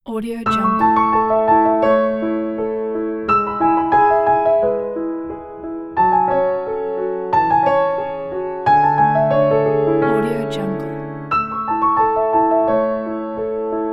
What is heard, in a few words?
happy day and piano; songrow